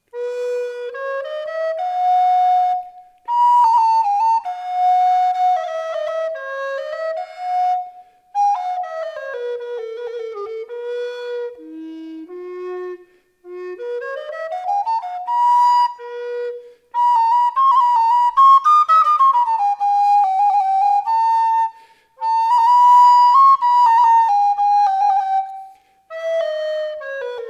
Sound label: Bressan Alto A405, recorders; Cranmore